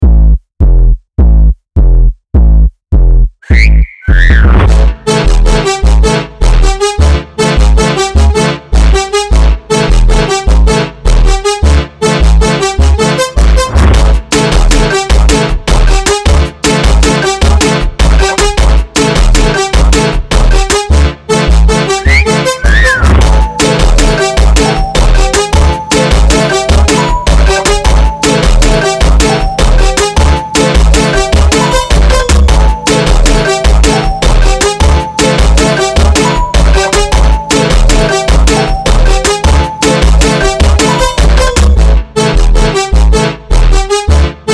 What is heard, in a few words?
bounce funk slowed